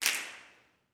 SNAPS, Gospel; Vocal Planet Vol1 Gospel; CLAPS+SNAP

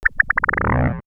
Cyber Yawn, sci fi synth fx; X-Static Goldmine

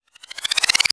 THIN AIR, PERC SWEEPS; ILIO; Trance Fusion

